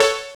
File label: DR. LOOP; X-Static Goldmine 3; CHORDS